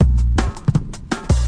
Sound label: 163 bpm 808 tight fast funk, drum loop